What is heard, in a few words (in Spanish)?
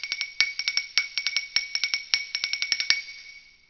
ritmo; efectos